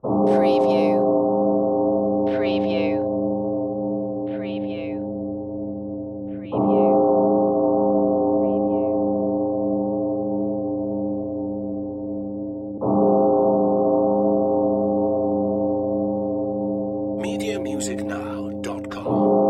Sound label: Church Bells Ominous